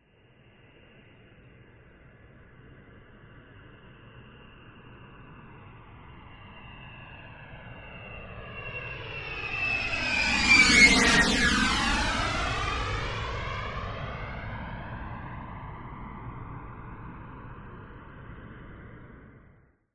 TRANSPORTATION JET MILITARY B-52 Stratofortress Flyby Left To Right Close